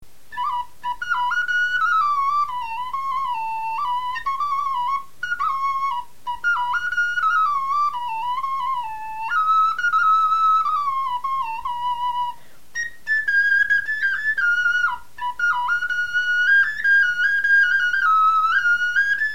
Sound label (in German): melodie, knochenfloeten